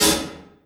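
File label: Cricket Oneshot, beats; Sonic Foundry (Sony Creative Software); Esoterik Beatz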